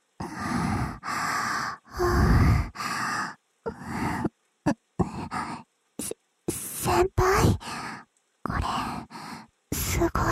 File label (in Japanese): 耳舐め天国『ぐぽぐぽ♪』『ふぅ～ふぅ～♪』『れろれろ♪』『じゅるじゅる♪』; 通常耳舐めver; SE有